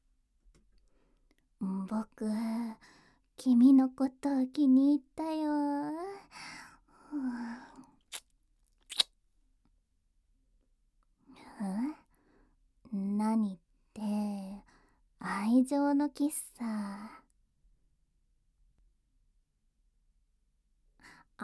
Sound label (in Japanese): キス; 【マゾハーレム】